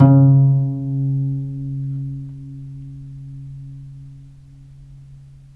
VPIZ C#2, Historical Instruments, VIOLEPIZZ; AKAI; USB Soundscan vol.23

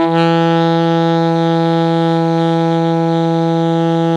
ALTO PP E 2, SAX; Greytsounds - Philip Wolfe David Crozier's Saxophones

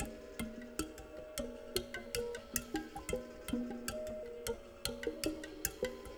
AMBIRA; Spectrasonics; Liquid Grooves 1 & 2; ODDS + ENDS

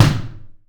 BOP, Anime Sound FX; AKAI Anime Sound FX

